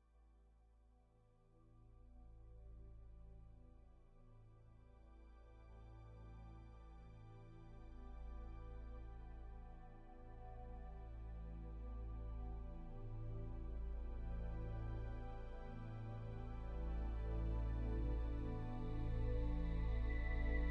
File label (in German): Klagelied ohne Sprecher